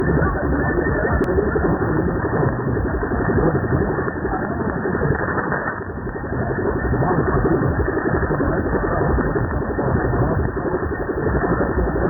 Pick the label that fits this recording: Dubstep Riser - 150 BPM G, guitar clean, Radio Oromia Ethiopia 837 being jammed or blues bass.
Radio Oromia Ethiopia 837 being jammed